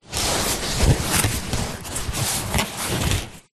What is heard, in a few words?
sport exercise mat foam thin rolled up handling